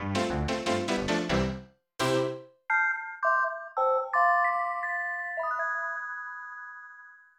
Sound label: Game Over Theme